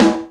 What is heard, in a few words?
Korg N5 Rock Kit